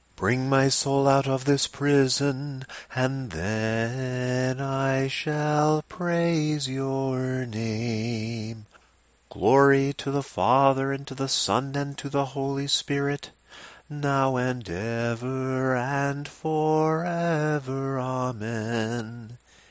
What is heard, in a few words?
Tone 8 samohlasen verses; EightTones; prostopinije